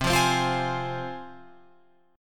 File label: C Suspended 4th Sharp 5th, C, down, Guitar, Open F; Csus4#5 strummed